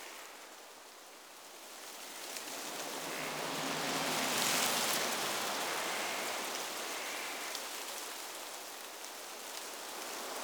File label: Exterior Bed Amb Loop, Ambience; Warframe; Awakening Remaster